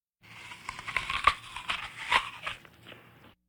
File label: Vocal effects breathing Moaning Baby